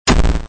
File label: Pickups thumbs down; The Binding of Isaac